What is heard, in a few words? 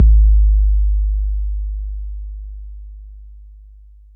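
bass drum 808 Smooth C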